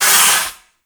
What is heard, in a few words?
Forest Water Pump Engage; Props; Warframe; Grineer Forest